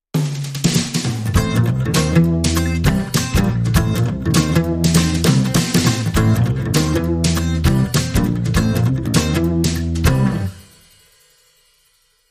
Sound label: Funky Style